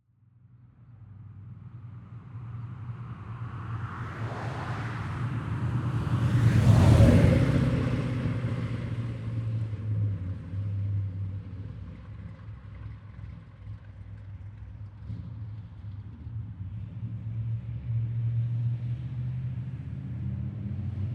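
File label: 1967 Corvette EXTERIOR Approach In Accelerate MEDIUM Lead car then Vette Left; to Right; Bryan Gold; 1967 Corvette Convertible Work-Up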